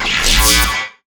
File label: zap